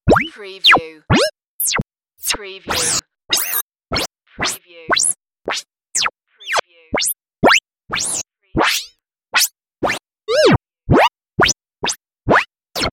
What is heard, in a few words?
space game sounds